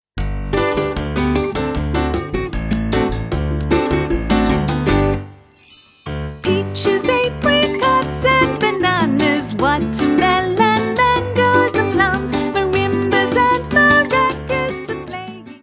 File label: Samba; Fruity